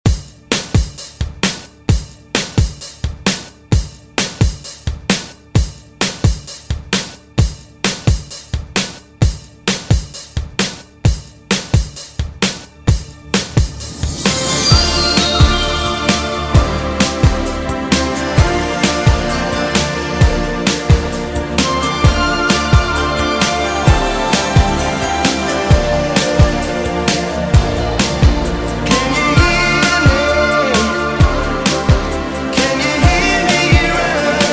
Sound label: 80s Redrum 131Bpm